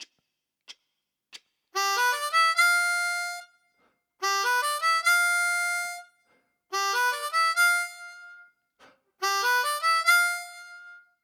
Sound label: Cross Harp Exercise